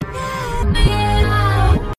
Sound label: reverse